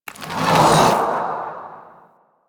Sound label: Embrace Raptura Cast; Warframe; Broken Frame